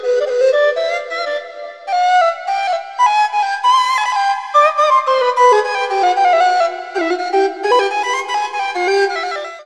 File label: Nay